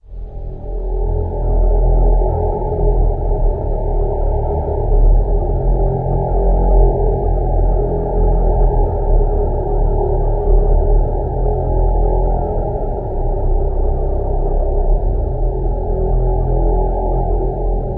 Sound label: Creature Melody Drone; Doom 3; 2004 pc gamerip